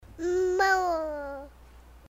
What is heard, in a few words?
Moo